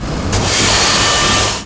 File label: KNIFE